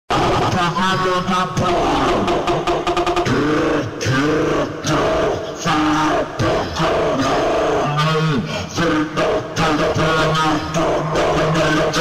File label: super slowed reverb